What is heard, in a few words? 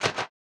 Hat